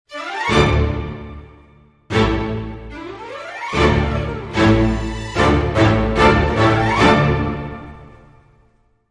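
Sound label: Dramedy Sting, No Percussion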